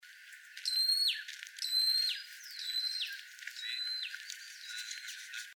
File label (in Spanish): tordo matorral